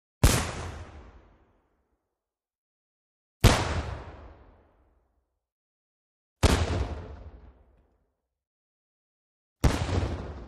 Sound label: Medium Single Cannon; historical